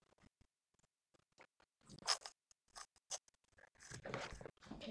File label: shut